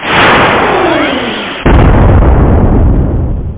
Explode; Chicken2

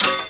drop; Gub2dem

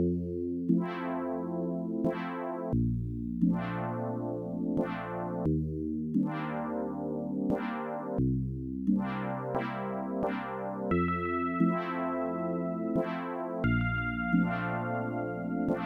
ROMANCE

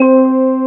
musicbox; cubic

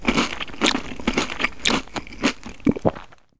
munch; Redneck

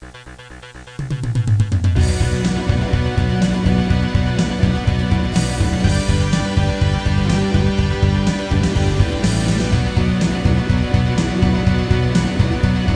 music; The Official NFL Yearbook 1995 to 1996

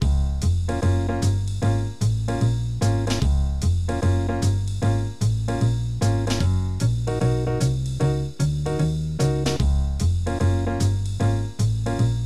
nightjazz

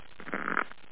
shuffle

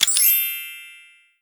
button click; Tabaluga's Print & Play

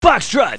FOXTROT; PC Games.EXE November 1997; Legend98

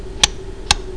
tictac; Les Grands Musees Europe; gioco

